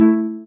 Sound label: soft hitwhistle; osu